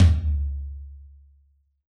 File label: rock tom, Yamaha DJX Rock Kit; 144 Rock Kit